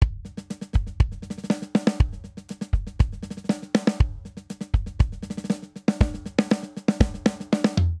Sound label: Bossa Nova Buildup 120 bpm; 4 4; MIDI Clips; Ableton; Factory Packs; Drum Booth